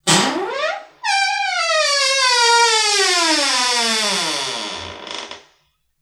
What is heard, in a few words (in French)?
grincement porte